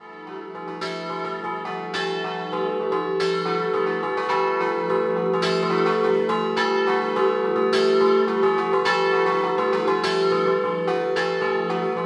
TORSA, campane

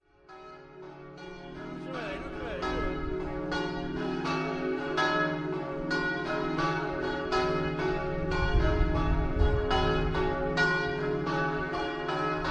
ZUGLIANO, campane

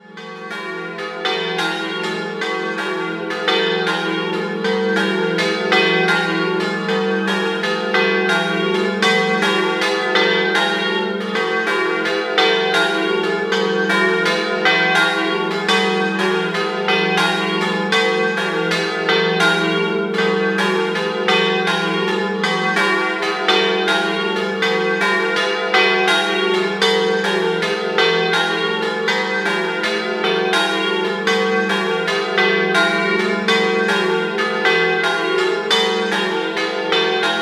CONOGLANO, campane

